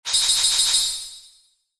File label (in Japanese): ランク レア度5